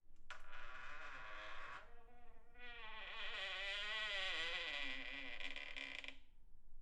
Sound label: Slow Quiet Door Squeak